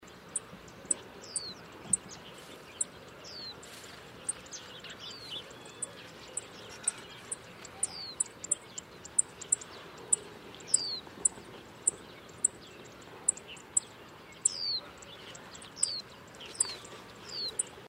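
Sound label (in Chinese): 黑臉巫, Yushan National Park; 黑臉鵐/灰頭鵐; 鵐科/冬候鳥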